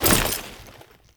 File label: Foley Step; Warframe; Kahl